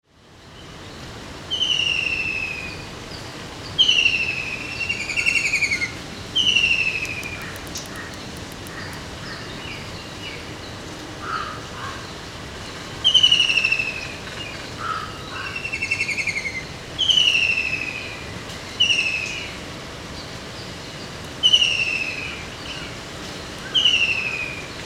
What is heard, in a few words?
White throated Kingfisher Halcyon smyrnensis